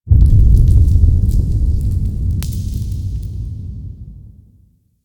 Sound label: Interior Cave Deep Boom; Ambience; Duviri; Warframe